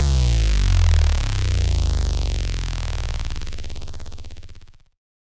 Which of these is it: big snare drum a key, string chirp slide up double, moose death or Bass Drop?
Bass Drop